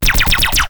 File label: Gun, Laser, Short Contained Burst; gun and weapon sounds; captain audio; fully loaded